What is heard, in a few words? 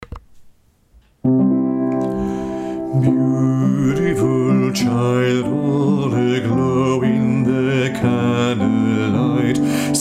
Born is the light alt